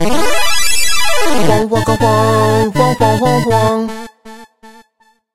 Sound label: Jingle Perfect Clear Bonus; Dandy Dungeon Legend of Brave Yamada